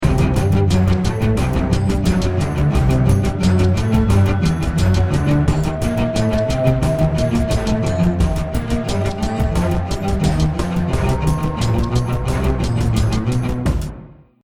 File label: start action, music